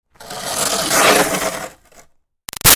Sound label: Snow Scrape Shovel By Gritty; Yard Tools; BigRoomSound